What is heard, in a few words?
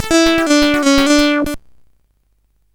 sid CSG8580R5